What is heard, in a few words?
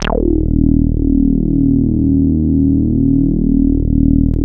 MOOG TAURUS EB1; Big Fish Audio - First Call Vintage Keyboards